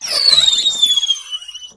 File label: Fist; fun and bullets